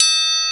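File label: Triangle, Roland S50 Percussion; Drum Samples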